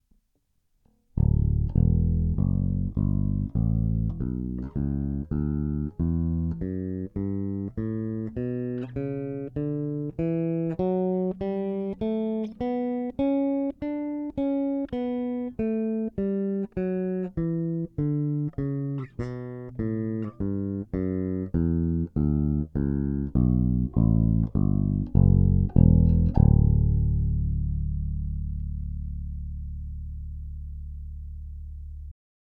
Both Pickups; LTD

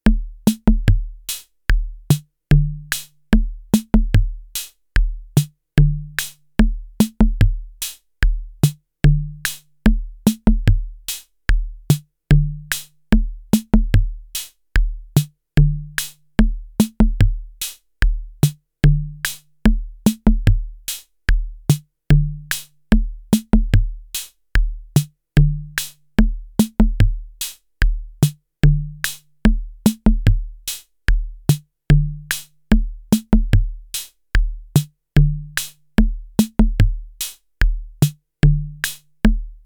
MFB 301 Pro

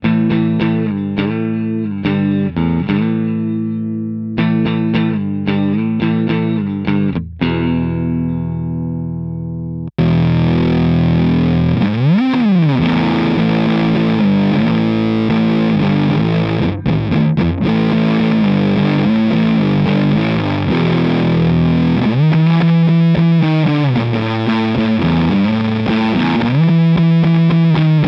Maybach Lester Junior Double Cut Both Fuzz

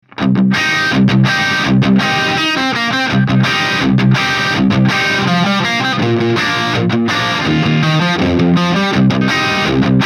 Peavey 6505 Crunch

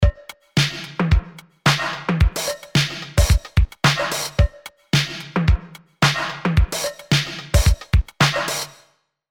Drum Bus Lowdrive Light; Arturia CMI V; Dist OPAMP 21

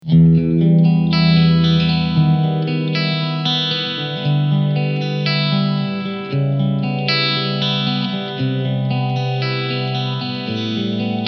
Warm Clean Flanger; Blackstar ID Core 40 V3

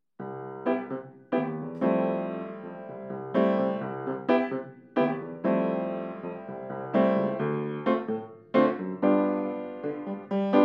Piano

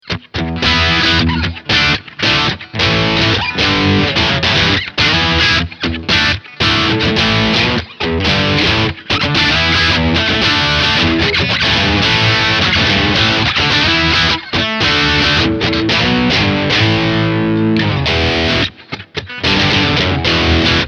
Boss IR-200 Metal

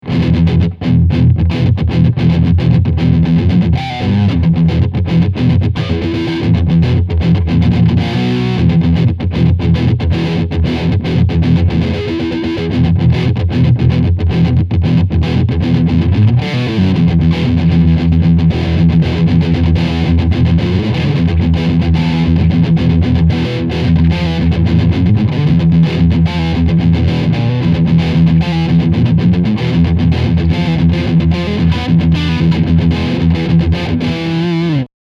Metal

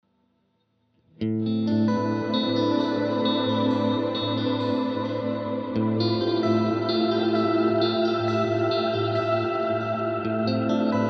Arp; WALRUS LORE

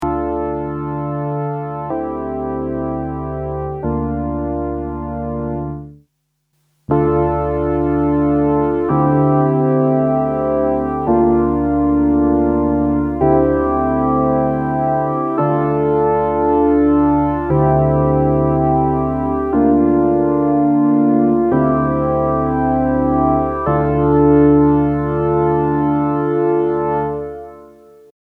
Roland SRV-2000 Medium Hall

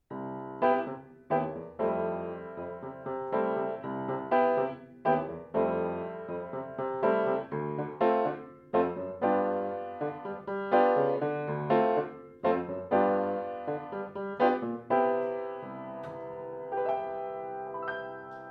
Yamaha PR7 Piano

